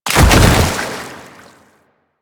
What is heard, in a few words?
Bodyfall Light Water; Warframe; Lasrian; Lasrian Soldiers; Foley; Body Falls